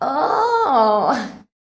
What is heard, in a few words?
ohhh orgasmic, FEMALE; MISC and SPOKEN WORD